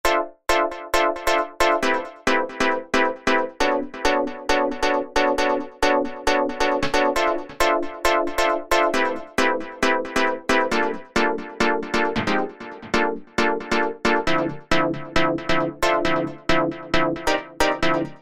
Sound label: SYNTH TEXTURES - Chord; Cleopatra Chord; Apple GarageBand 2